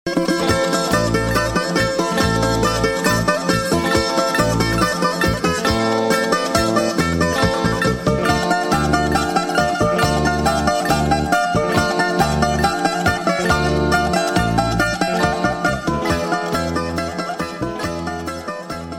Zebekiko - Grece

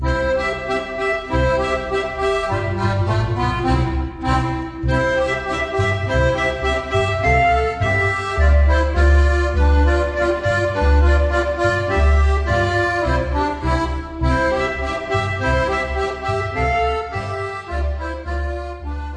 Kroatisch-Schottisch, Autriche

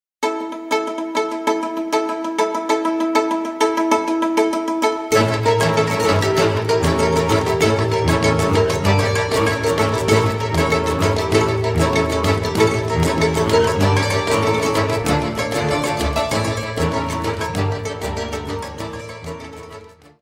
Cocek - Macedoine

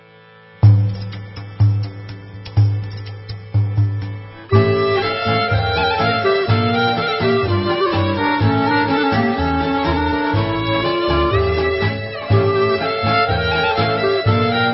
Oro, Albanie

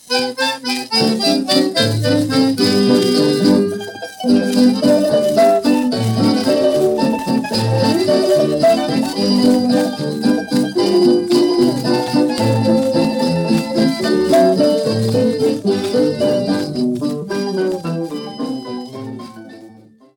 Chamarita - Portugal

